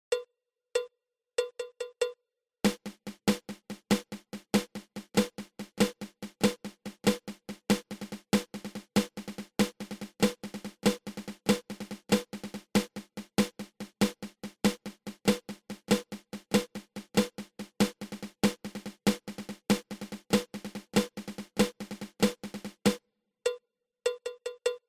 Flam Drag

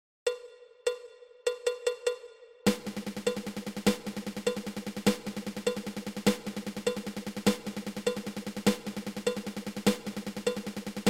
Eleven Stroke Roll

